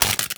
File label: electrocuted spark